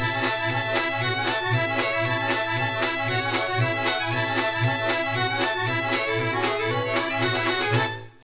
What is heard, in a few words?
Separated Harmonic Sounds